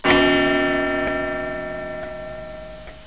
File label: chime